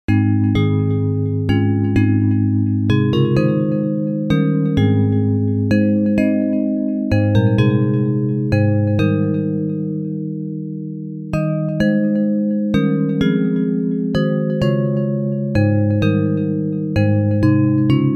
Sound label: Bells